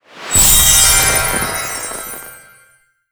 SUCCESS

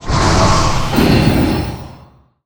Critical Defence

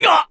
Hit

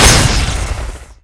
Electric Shock

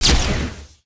Armor Break

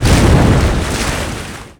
water armor exp

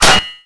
guard hit

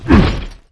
Woosh

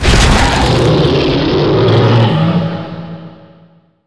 Die

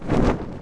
fly

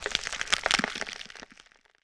hit bone

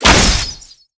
Bow shot

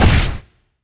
hit

